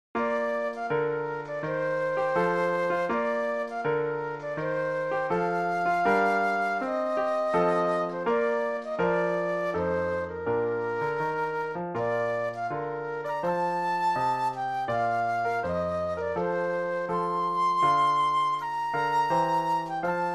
Air Flute Solo; classical; Handel; HWV 348

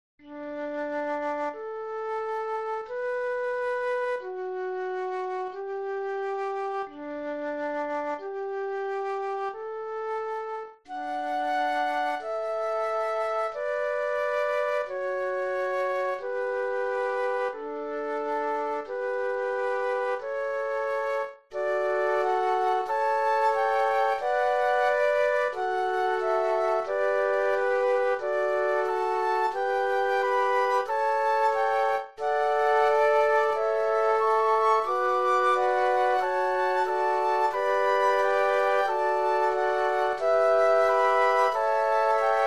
Canon Flute Quartet; classical; Pachelbel

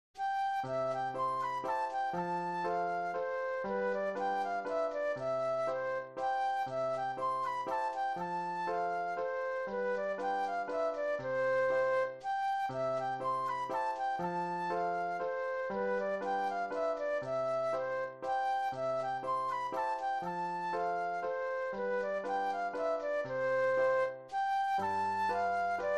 Landler Flute Solo; classical; Schubert; D. 378 no. 5

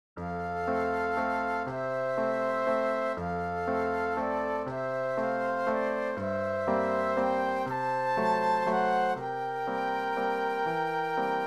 waltz flute duet and piano; classical; Tchaikovsky; Sleeping Beauty

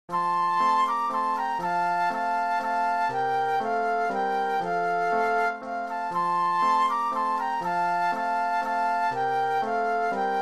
Suse Liebe Suse Flute Duet and Piano

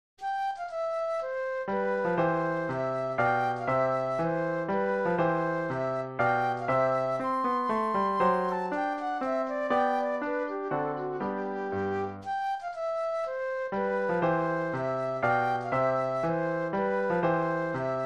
Minuet Flute Solo; classical; Mozart; K. 94

